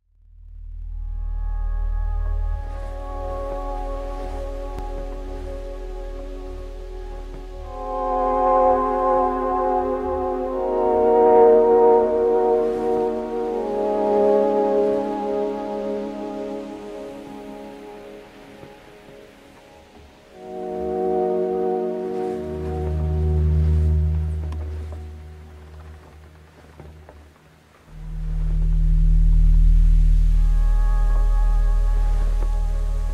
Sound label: soundtrack ambience city; hyper light drifter